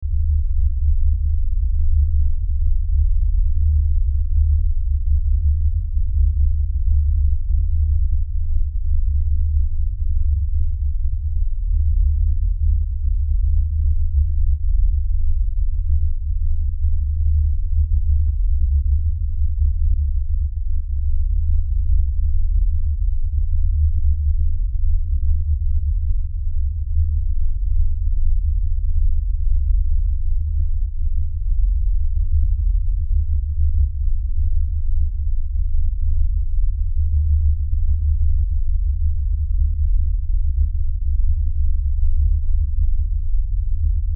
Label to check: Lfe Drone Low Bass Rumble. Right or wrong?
Right